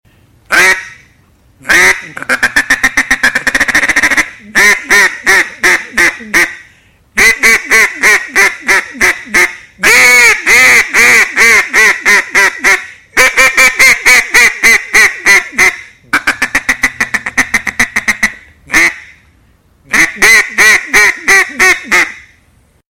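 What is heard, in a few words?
duck sound for censorship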